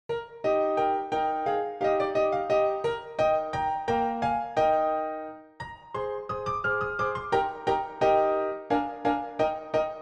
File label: Dance PianoTeq Bechstein